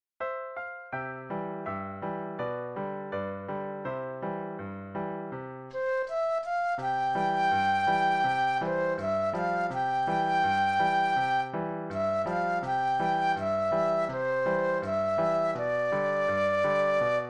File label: Flute Solo; christian solos